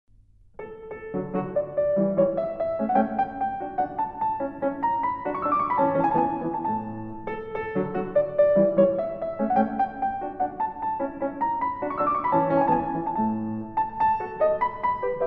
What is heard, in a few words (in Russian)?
Соната ре мажор тема финала; Гайдн